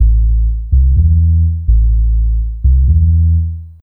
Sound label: DEEPHOUSE BASS 125 A